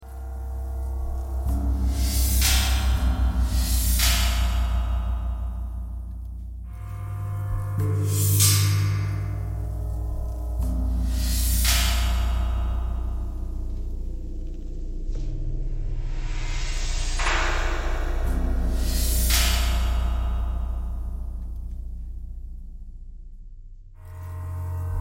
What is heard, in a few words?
knocking thread noise